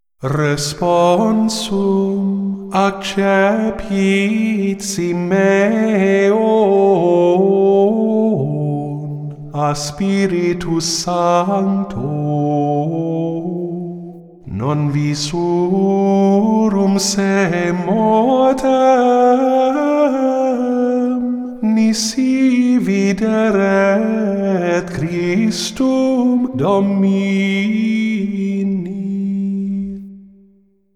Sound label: Gregorian Chant Propers; Responsum Accepit; Communion